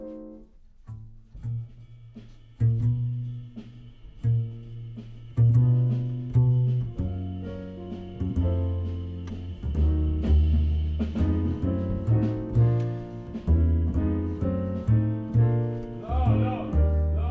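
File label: Contrabass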